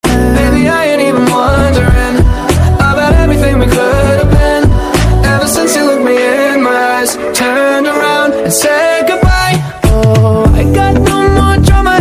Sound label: english ringtone